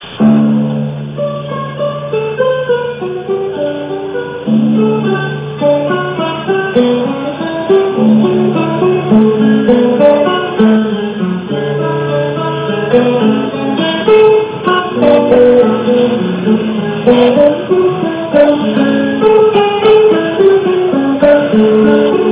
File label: prelude, guitar